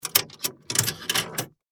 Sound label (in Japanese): 公衆電話 お金 入れる